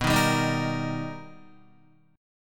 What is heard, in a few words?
B Augmented Major 7th B Guitar, chord; B+M7; strummed; JGuitar